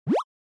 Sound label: Anime Motion, Mid